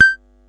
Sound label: silly elec fx; drums full kits; unusual kits and fx; Silly Electro FX Kit